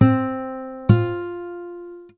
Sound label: interval ascending